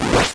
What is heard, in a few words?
Fly Start; Celerity